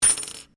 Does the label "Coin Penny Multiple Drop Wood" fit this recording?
Yes